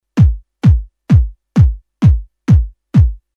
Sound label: bass drum with batter click